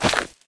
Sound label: cleats dirt, player footsteps